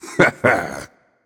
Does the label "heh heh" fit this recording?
Yes